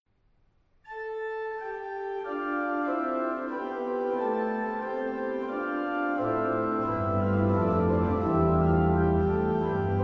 Orgelvers Pedal

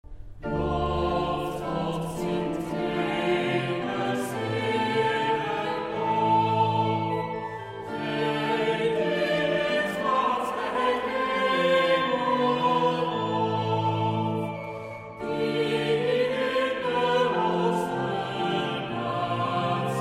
Psalm vocaal

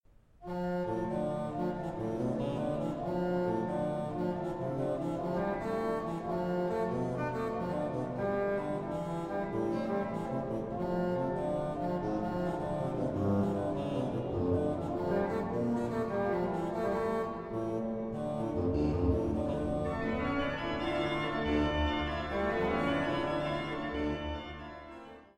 Orgelpartita; Variatie 2

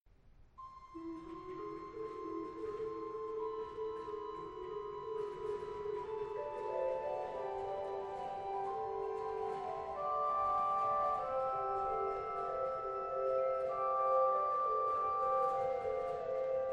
Orgelvers

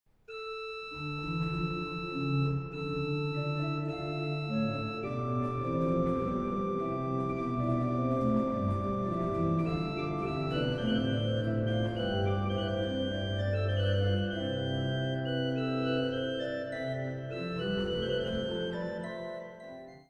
Orgelvers

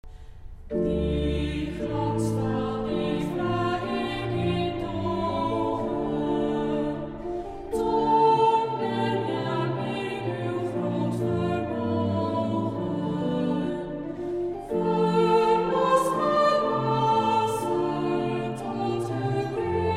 Psalm vocaal

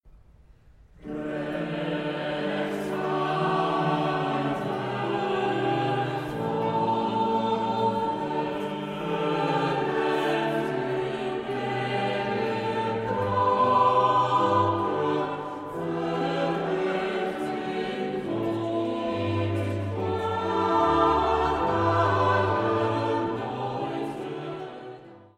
Koraal; Psalm 32 6; “Rechtvaardig volk verheft uw blijde klanken”

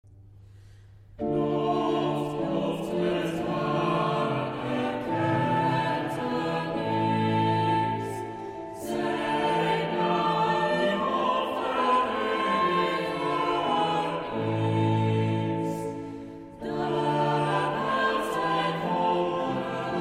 Psalm vocaal

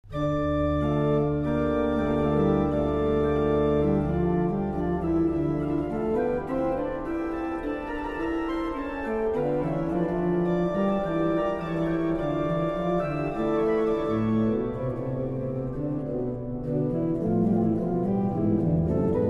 orgel

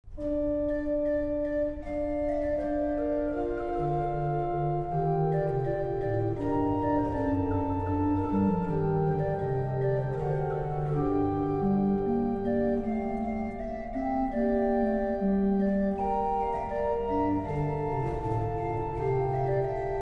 orgel

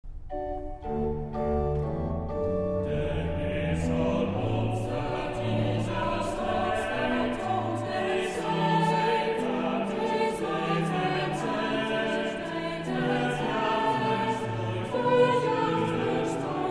Psalm vocaal

